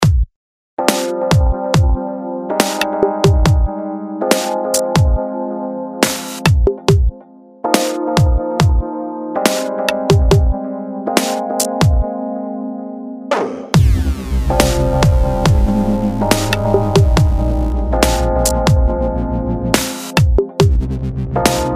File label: dubstep